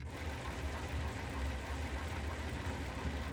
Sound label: reel Spin; cashomatic